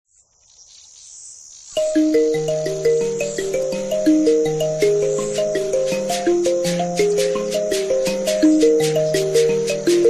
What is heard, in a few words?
mbira nhemamusasa; celebrating mbira nhemamusasa